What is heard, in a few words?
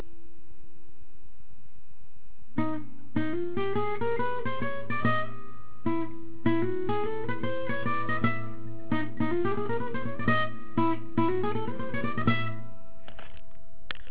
b section lick